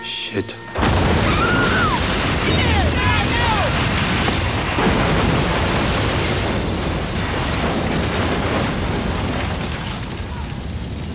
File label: explode